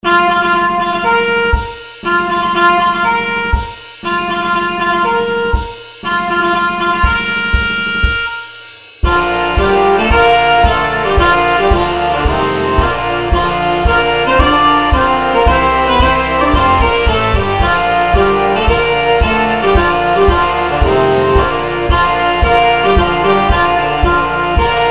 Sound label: Hail Chief, Brass; Hail to sthe Chief; with "Ruffles and Flourishes"; aranged by Les Deutsch; Brass, Organ & Percussion